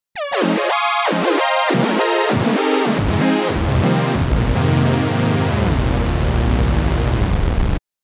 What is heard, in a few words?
full analog